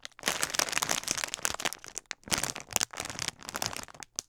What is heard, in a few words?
Chip Bag Crackling